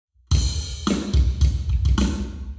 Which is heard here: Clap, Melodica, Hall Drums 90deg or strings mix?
Hall Drums 90deg